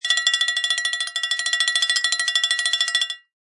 Cartoon Bell Clicks, Quick dinks, like running.; Comedy/Cartoon; Cartoon Music

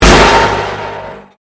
Convolution Pulse

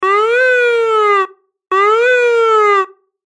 Ambulance Siren, Ambulance Siren Double; Emergency; Emergency Vehicle Sound Effects

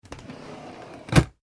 household drawer wooden shut; Wooden Drawer Closing; Drawers; McKinney Sound

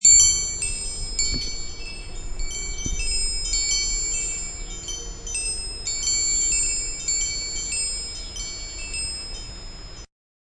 Windchimes Outside, windchimes outside.; High quality stereo recording of windchimes outside; Musical

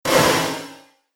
Synthetic Crash Sound, impact; Miscellaneous Impact Sound Effects

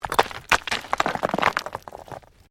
STONES MOVE

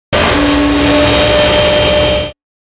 Electrical Metal Shine; Production Element Imaging Element Accent Transition; 5.1 STEREO MIX; Blastwave

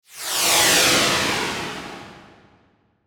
Whoosh Flange Descend Slow; Blastwave

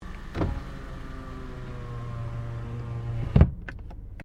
CAR SUBARU LIBERTY INTERIOR IDLE WINDOW CLOSE

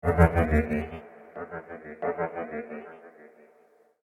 Alien Bike Speeder; Alien Sound Effects; science fiction sound effect; star wars / trek style games

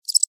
Cricket Chirp, Single, Insect; Animal; Bug; Crickets

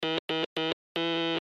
Morse Code V Letter; Blastwave

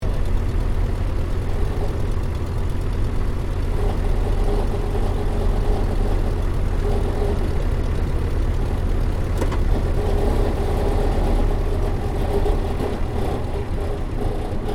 AIRCRAFT RYAN PT22 TAXI INTERIOR; 1942; Airplane Sound Effects; Airplanes (Sound Effects:Vehicles)

